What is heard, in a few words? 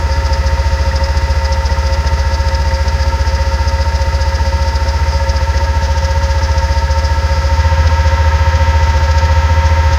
constant clear nissan gtr, engine